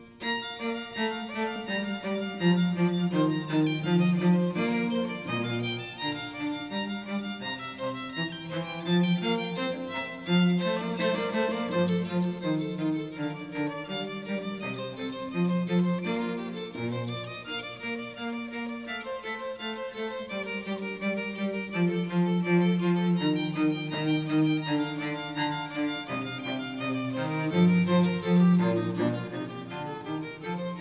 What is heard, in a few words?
String duo ensemble